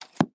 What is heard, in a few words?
Foley Slingshot Shoot Object Impact Thud; Frogsong